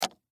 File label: Game Card Drop, On, Board, Property, Cards, Large; Sports; Monopoly; BlastWave